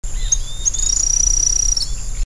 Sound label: Machetornis rixosus